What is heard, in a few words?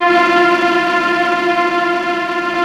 TREMOLO F4, TREM STRINGS; Greytsounds VOL-1